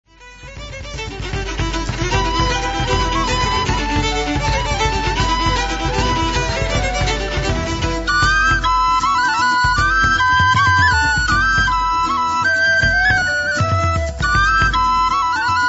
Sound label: Country